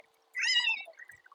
Trembling

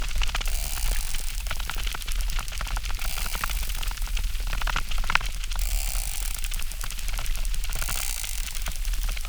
Zap, acquarium

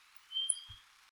Chirp

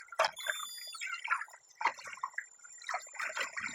Chirp constant

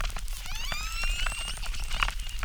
Chirp zap, acquarium

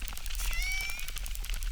Chirp zap, acquarium

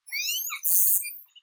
Chirp zap chirp

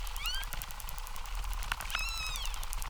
Trembling chirp, acquarium

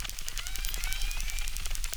Chirp, acquarium

